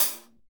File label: HH HH, HH Real